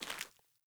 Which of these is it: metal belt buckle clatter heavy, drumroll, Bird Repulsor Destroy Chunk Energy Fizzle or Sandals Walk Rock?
Sandals Walk Rock